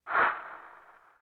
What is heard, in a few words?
woosh